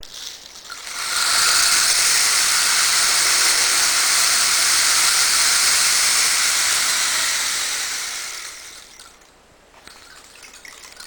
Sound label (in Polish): kij deszczowy